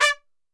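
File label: D3 trumpet shot, Brass Super Section; Best Service; TRP SHOT; 90 s Sample CDs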